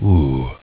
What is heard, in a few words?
message; gnomeicu